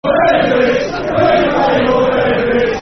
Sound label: Football Chant; We Pay Your Benefit